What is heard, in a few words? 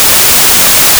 white